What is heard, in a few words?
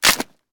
Bone Damage; angry birds